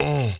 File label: Processed Chant "Ugh"; Cymatics; Euphoria Vocal Sample Pack